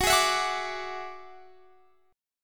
Gb 7th Suspended 2nd Sharp 5th Gb down Guitar Standard; strummed; JGuitar